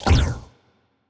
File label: fire rate laser muzzle, weapons; Stellaris; biogenesis